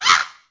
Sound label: bird collision; Angry Birds Space